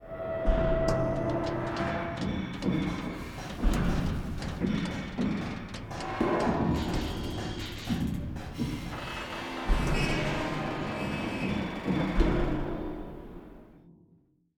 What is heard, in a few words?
ambient port; clanbase